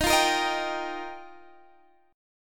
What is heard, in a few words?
F 7th Suspended 2nd Suspended 4th Eb